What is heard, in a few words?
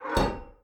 pet reset; smite; vulcan